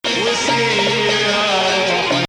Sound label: Bayati Bayati; Bayati 1